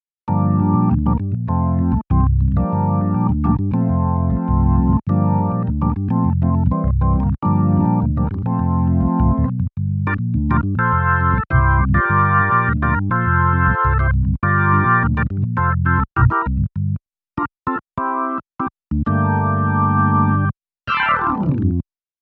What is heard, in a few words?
Nord Stage EX B3